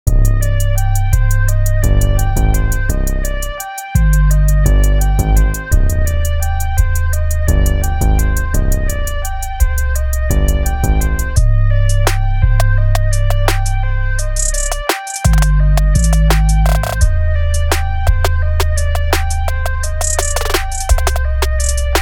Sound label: good beat